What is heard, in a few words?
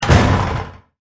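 metal door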